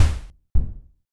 giant step

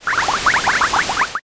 boiling

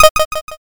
toggle off

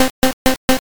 chew

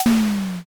door open

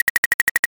typing

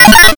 glitch

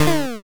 damage

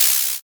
paper tear

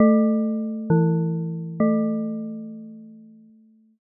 church bell

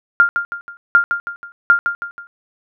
ecg

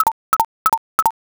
fs geta

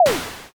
stone throw

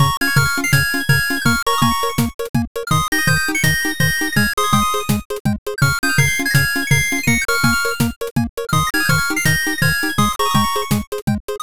bgm bright

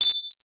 tambourine

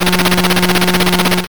slot spin

